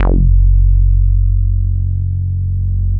80s Synth Syn Bass, F1

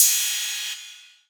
Crash 12 Dry

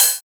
hat

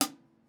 Hihat; PUNCHOUT; Mustard

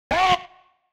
Chant A-m

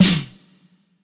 snare left

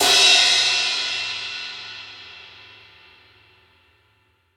Crash

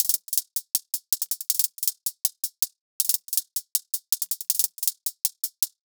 160 hihat loop; sick

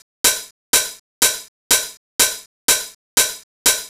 Hit Open Loop Cm 123

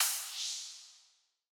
Cymbal, Dm, Hit, Impact

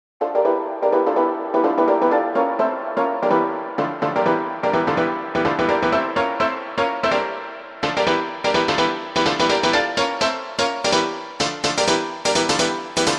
Break Piano 126 Em Tail

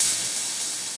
hihat wanting

